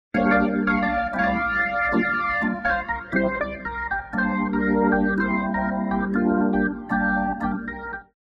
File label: Rotary Speaker, Bouncing Vibes, Organ Wet